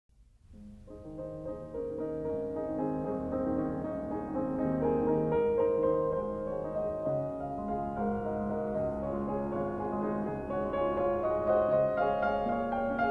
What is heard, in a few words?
Etude for piano No. 26 in A flat major; Discovered Piano Treasures